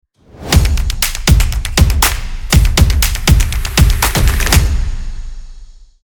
Fast Stomp Opener

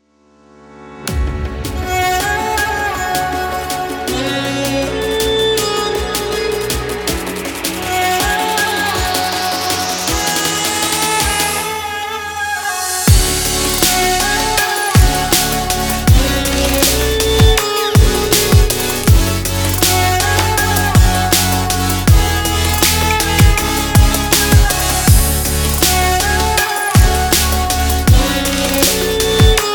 Arabic Future Bass Kit

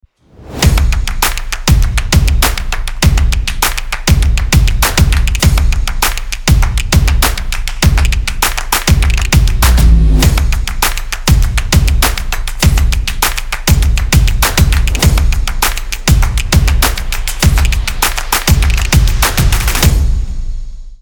Stomp Intro

